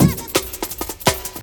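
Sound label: breaks, JUNGLE; M8JUNGLEPAK1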